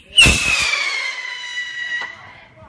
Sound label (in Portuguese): fogos ano novo